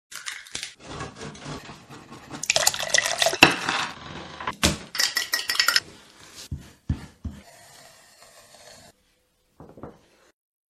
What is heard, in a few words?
Food Sound Audible Recipes ASMR Cooking